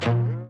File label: cloud bounce; Teen Titans Go! - Raven's Rainbow Dreams